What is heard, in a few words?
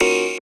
Fill Tech Ahh 160 D#